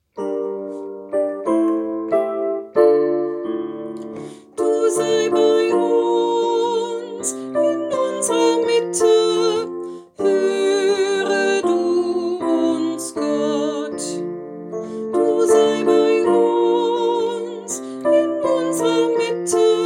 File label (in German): Fuerbitten Hoere Melodie; Rundfunk 2020